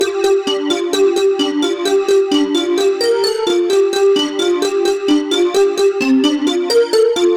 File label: G Seq FM Pipes; Zero-G; Future Wave